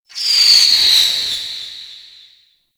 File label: Hazard Spark Projectile; Warframe; NineteenNinetyNine; Stadium